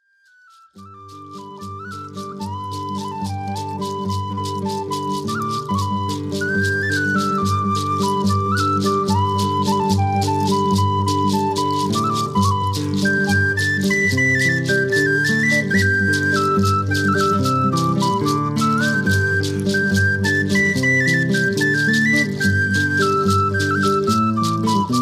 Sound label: Peso Whistle